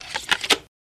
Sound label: Telephone Antenna